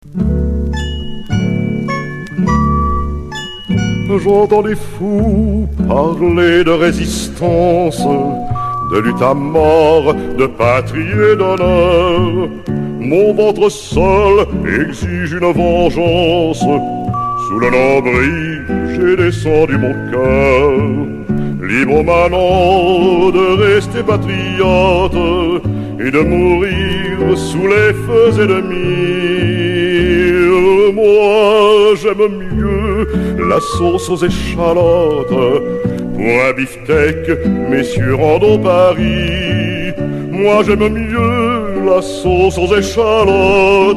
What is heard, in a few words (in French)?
chanson historique 1870